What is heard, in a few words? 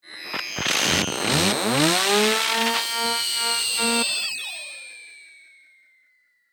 Sweeper, Ascend, Digital, Flutter; BlastWave